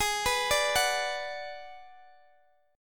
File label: G sharp Minor 7th Flat 5th, Guitar, Open G; x,x,18,16,15,16